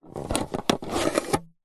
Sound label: food drink plastic straw slide in plastic fast food drink lid; zapsplat